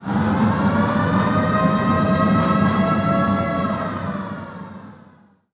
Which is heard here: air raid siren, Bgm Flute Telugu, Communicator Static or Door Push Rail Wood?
air raid siren